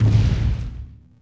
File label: fs bantha r, creatures; Star Wars Obi-Wan; XBOX